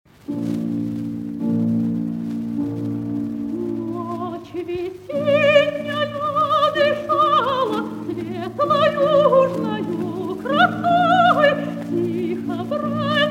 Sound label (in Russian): Романс; Глинка; Венецианская ночь